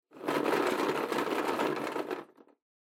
Drawing Box, Rustle

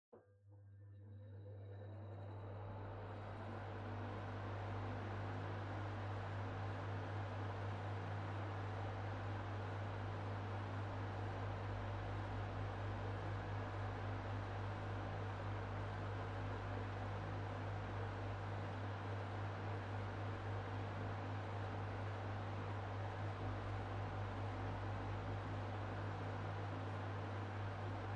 Electric Fan (Far-Mid)

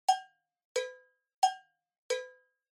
Cowbell (Phrase)

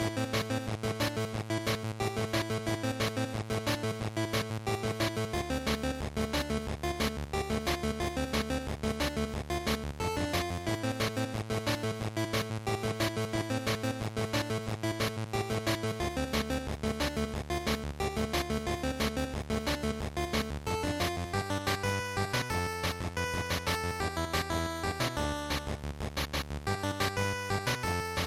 Racing Stage Loop, bgm

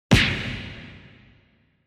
Hit Punch, Reverb; 打撃 パンチ05-5(リバーブ)

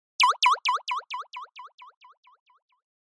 Motion Pop

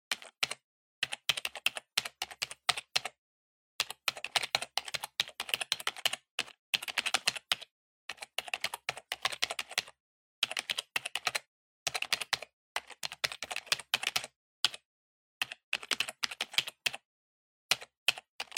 PC Keyboard Mid

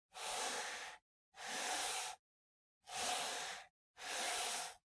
PC Mouse Move Soft Pad Long

